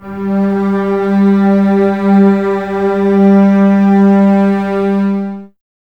Basses G 3, String Ensembles; Miroslav Vitous; 90 s Sample CDs

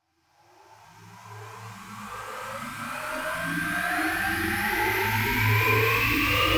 riser; M8; riser sfx

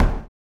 STOMPS; Spectrasonics; Vocal Planet; Blues Soul

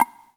Percs, Elektron MachineDrum SPS1 MKII

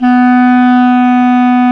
CLAR, Clar Jazz; Clarinets; Roland L-CDX-03 Disk 1

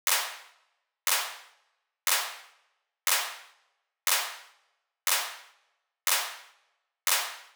Clap, 120bpm D, Nu Disco; Zero-G; Happy People

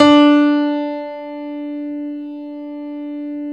Stereo Grand, Keyboards; E-MU Producer Series Vol. 2 – More Studio Essentials

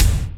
KICK ROOM

